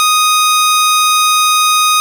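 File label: string D#3; fleet keys Samples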